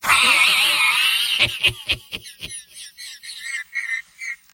laugh long; soldier; mvm

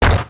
giant scout step; Garry's Mod; tf2; mvm

